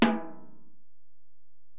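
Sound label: Acoustic Snare, Alesis DM-PRO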